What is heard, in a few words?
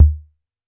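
vermona drm1 mk3 bass drum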